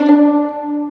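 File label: Violins Pizz; STR; Roland L-CD702; Vlns Pizz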